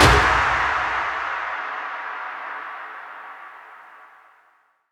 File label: PERC FX, Dance Drums